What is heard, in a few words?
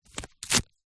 paper rip thin magazine page; zapsplat